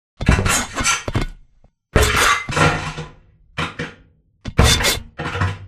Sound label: Prowler Cage Charge; Boomtown; Titanfall 2